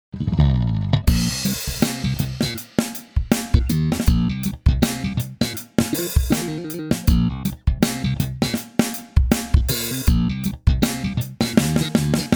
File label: E-Bass Slap Funk